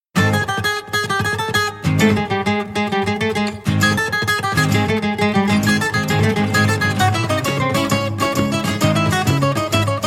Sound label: Tarantella